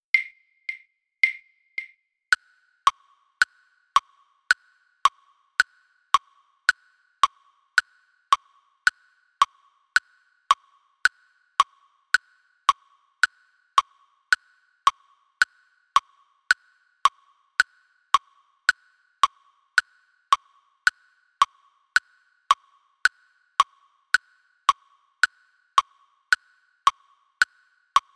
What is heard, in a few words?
click